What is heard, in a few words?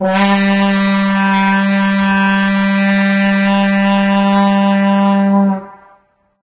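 vuvuzela; source engine; tf; football manager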